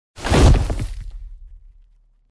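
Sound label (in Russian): zvuk odnogo shaga dinozavra, sound of a single dinosaur step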